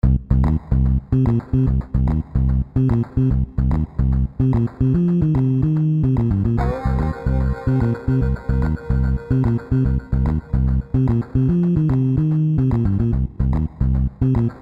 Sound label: Noire Crime Time (Low-Fi)